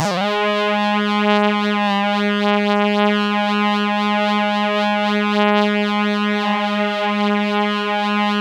C3, Synth Line; 90 s Sample CDs; NiceBeats; SynthLine VOL-24

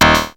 TECHNO SPLIT, ENSONIQ ESQ-1; Rubber Chicken Software Sample Bank

